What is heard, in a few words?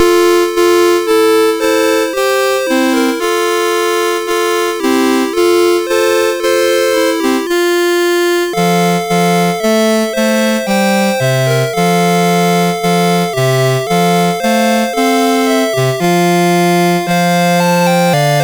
Angels we have Heard on High, CSG8580R5